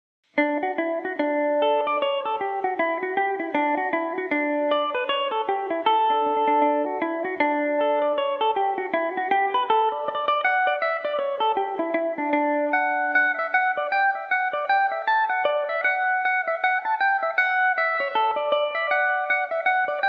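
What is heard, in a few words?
humours of scartaglen D major revelation, mandolin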